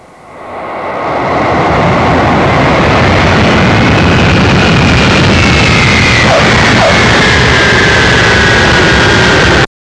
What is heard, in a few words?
737 LAND